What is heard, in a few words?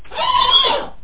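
squeaky chair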